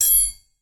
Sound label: metal